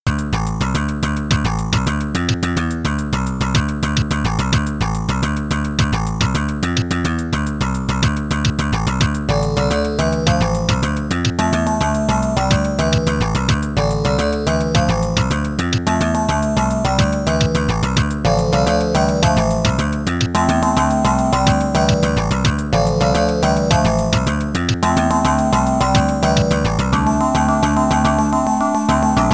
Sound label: Boss Fight; Winter Camp; Amiga